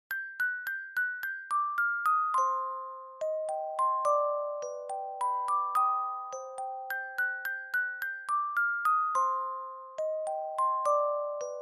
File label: Dry Fast; For Elise